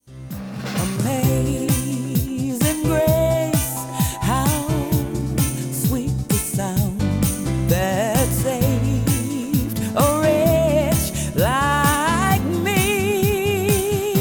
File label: gospel choir amazing grace